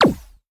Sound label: laser; factorio; Factorio 0.14.21; fight